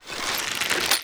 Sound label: bow draw; garrysmod